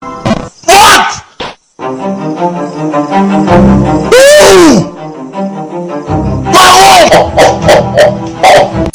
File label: bass